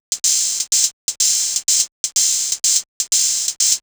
drum before hat 125, Classic Chicago House Drum Loops